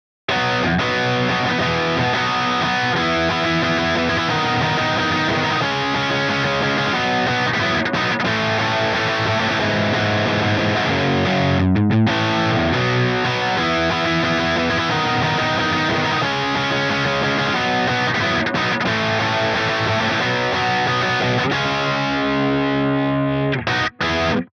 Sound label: guitar off